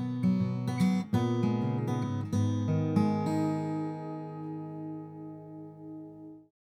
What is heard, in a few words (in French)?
guitare acc c414 preamp a fond; rupert neve portico